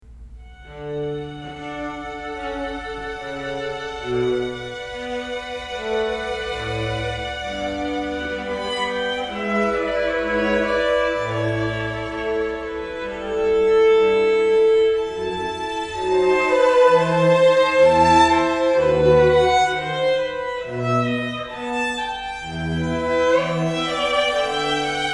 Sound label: Air Quartet; Bach